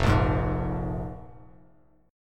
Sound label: F Minor Double Flat 5th, F, Bass Standard; Fmbb5 strummed; 1,1,6,1